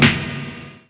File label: klack; PowerPlay1097